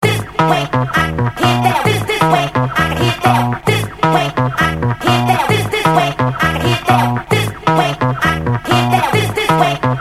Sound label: short mix